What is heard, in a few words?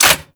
Rifle Mech; Warframe; Infested; Quanta